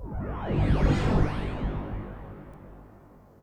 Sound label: FX Industrial 140